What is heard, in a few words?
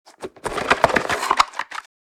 Board game box open